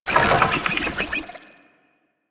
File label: Fishing Fish Caught; Warframe; Infested Fishing Spear